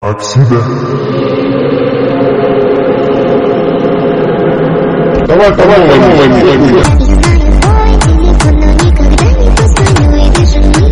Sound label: Hardstyle Remix